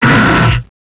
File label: big hit; cstrike